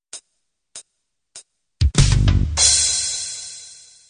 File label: home beat intro